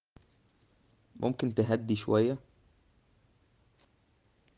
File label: traffic